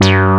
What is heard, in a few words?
MD-MOGY, SYN BS; AKAI S6000 CD-ROM - Volume 1; SAMPLE WAVE MIXING 1